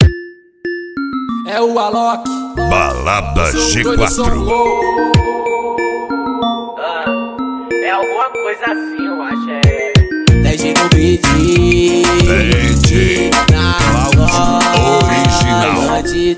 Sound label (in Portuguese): brega funk; putaria 2020